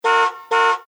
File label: roulette; LG GD900 Crystal; Game Sound